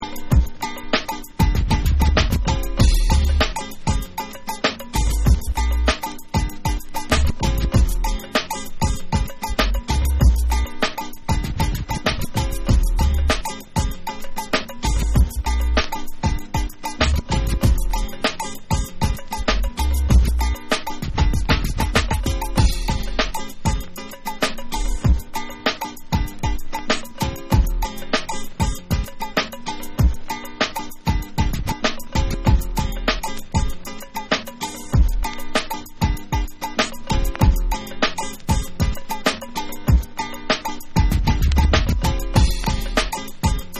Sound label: SPANISH GUITAR